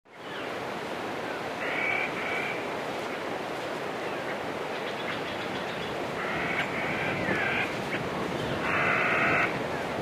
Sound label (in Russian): zvuk lesa penie ptichek i voda